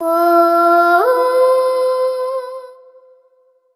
sung oh, stabs

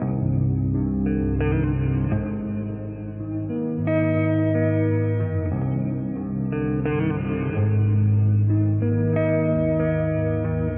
guitar gloomy; Loops; Sad

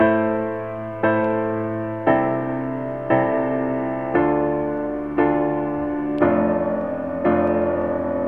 piano, Loops Sad

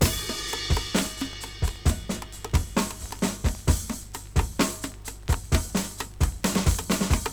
bosanova, drum loop

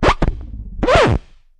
skip, Percussion, Vinyl

